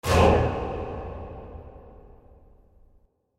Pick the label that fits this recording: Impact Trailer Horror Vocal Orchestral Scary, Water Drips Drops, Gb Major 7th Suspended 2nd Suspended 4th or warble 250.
Impact Trailer Horror Vocal Orchestral Scary